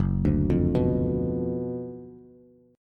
Gb Major 7th Suspended 4th Sharp 5th, Bass; GbM7sus4#5 arpeggiated; JGuitar

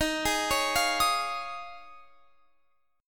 Eb 9th Suspended 4th, Guitar, Open F; Eb9sus4; arpeggiated

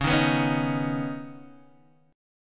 C sharp 7th Suspended 2nd, Guitar DADGAD

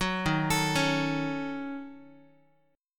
D sharp Minor 7th Flat 5th D sharp down Guitar; arpeggiated; JGuitar

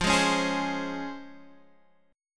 C sharp Major 7th F

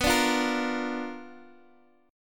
B-Minor Major 7th Flat 5th B down Guitar DADGAD

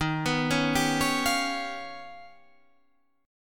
D sharp Augmented 9th D sharp Guitar Standard; arpeggiated; 11,14,11,12,14,13; JGuitar

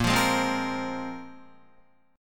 Bb 7th Suspended 2nd Bb, Guitar 4F 4A 5C 5G 5C 6E; 5,8,8,5,5,6; Bb7sus2 strummed; JGuitar

